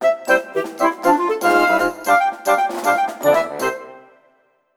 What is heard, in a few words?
Jingle, Level Clear!; pushmo 3ds ost